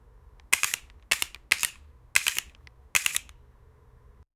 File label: loud pistol sound; firearm